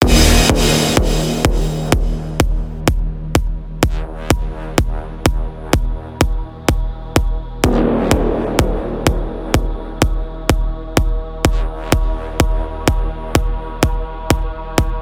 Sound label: Mashup; reverse impact friends mashup edit pack vol 2